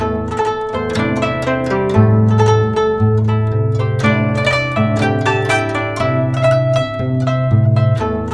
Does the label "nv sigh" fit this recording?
No